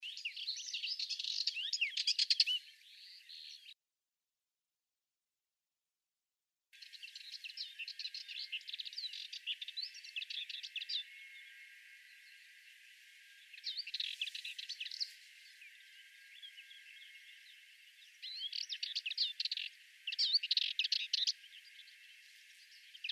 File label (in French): Fauvette melanocephale